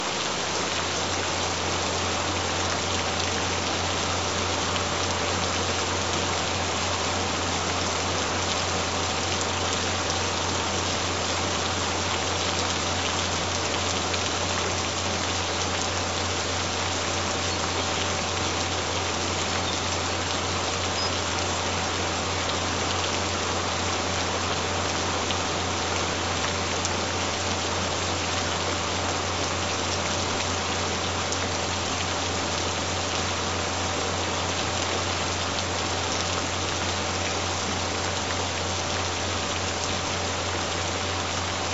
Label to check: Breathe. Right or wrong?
Wrong